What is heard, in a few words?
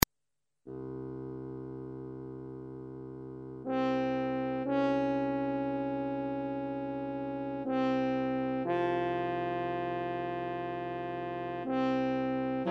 quintette